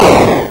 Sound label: fire